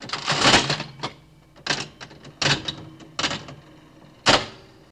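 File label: Slot Machine Pull Handle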